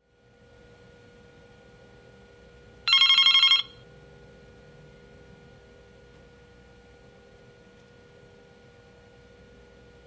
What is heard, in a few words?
Phone Ring with Scanner